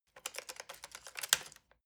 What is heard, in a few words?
decoding machine handling rattle and clicking